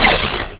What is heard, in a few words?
laser; MiniSpeak Special FX